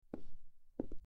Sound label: footsteps on wood floor